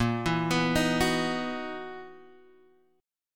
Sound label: Gb 13th Bb, guitar